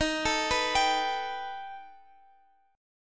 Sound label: B Augmented 7th Eb